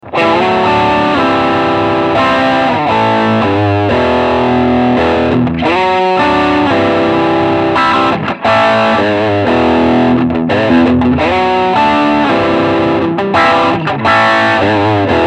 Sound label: TS Full Drive Riff